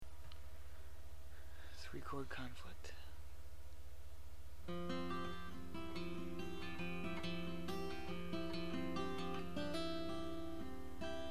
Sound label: 3 CHORD